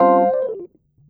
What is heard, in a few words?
E. Piano